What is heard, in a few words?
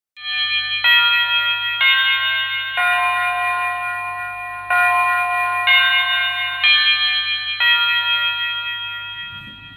Ding dong